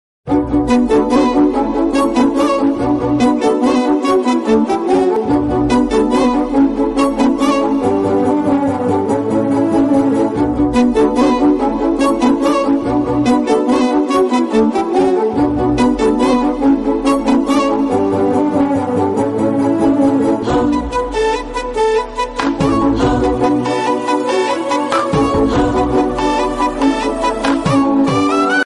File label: entery bgm ringtone; tuck jagadish; nani